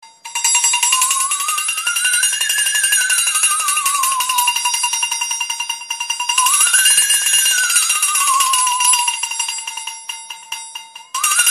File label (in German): Flexaton Profi